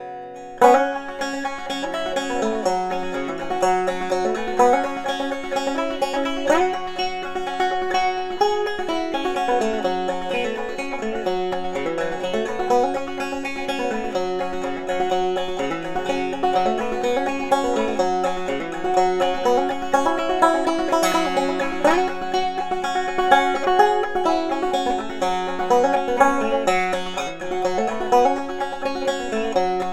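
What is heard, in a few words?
banjo, African Blackwood-topped rim; Recordings: M-Audio microphone and Fostex MR16 recording studio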